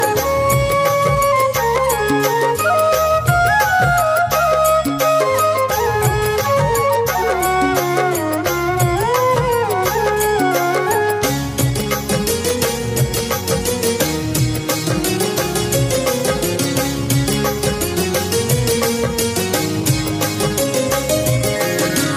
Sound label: Prabhuji Tum Chandan Hum Paani Music; ringtones; Krishna-Ji